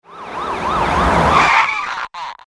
police car arrive; Beat the Bobbies 2

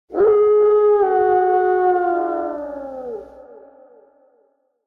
wolf Howl; Shamans Dream 2

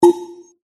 clonk; Fluffy Favourites Lucky Tap

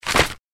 policecar win panel appear; Beat the Bobbies 2

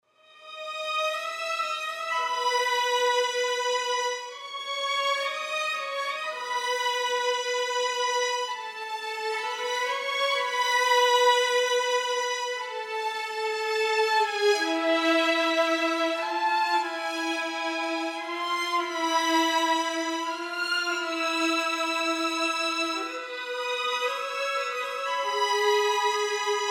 general Music strings; Lucha Rumble

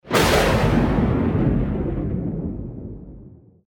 lightning Blast; Champions of Valhalla

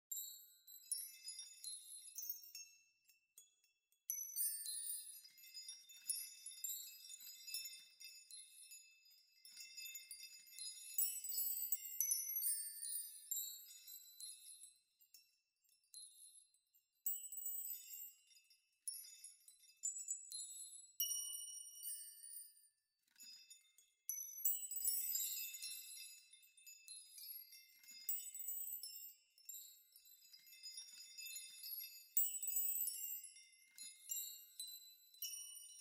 bg amb chimes; Eternal Wishes LuckyTap

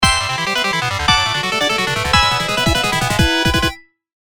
win super; Step Back 7's

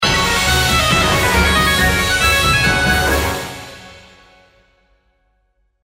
super win; Highland Reels

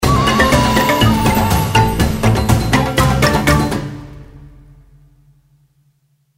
win super; Stampede Call of the Pride; Eyecon

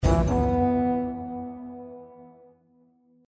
horn; Champions of Valhalla

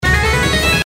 anticipation start; Highland Reels

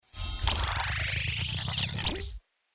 ladder numbers flip in; Fondant Favourites StarTrail; Eyecon

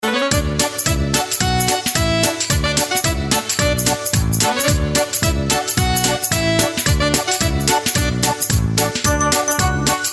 free games music shorter; Fluffy Favourites Remastered

